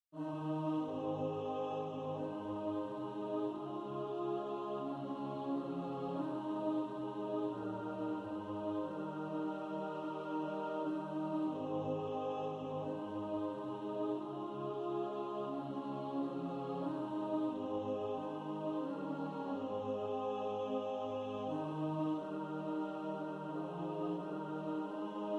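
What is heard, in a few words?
alto, practice music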